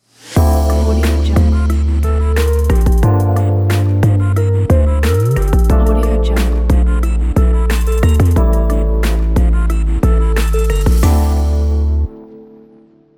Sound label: lofi trap logo